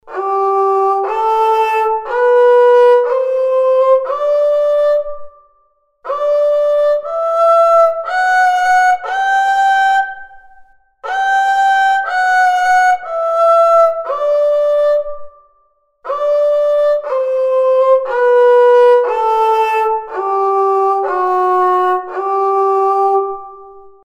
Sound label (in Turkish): kemençe rast